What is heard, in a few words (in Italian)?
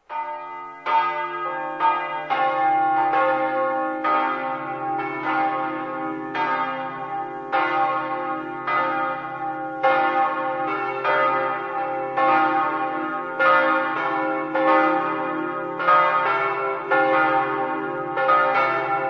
castions, campane